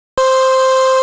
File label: VOCAL 130BPM delay; SONIC ELEMNT; SONIC EL YES